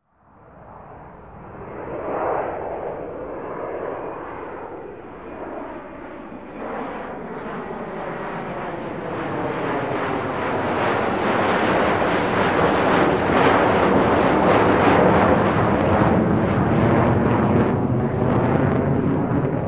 Jet aircraft take off flying over

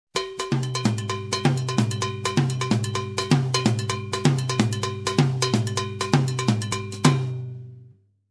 Latin drum groove

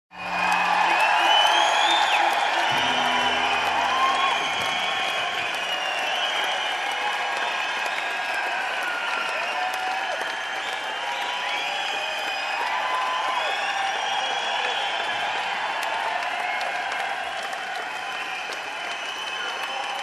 Crowd cheering and whistling

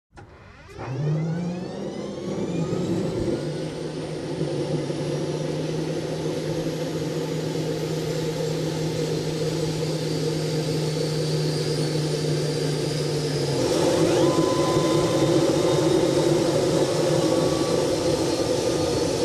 Helicopter engine start from cockpit

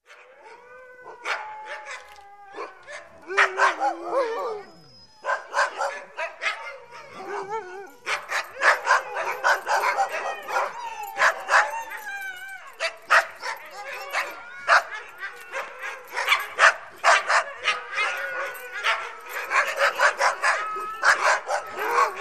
Many Dogs whining and barking

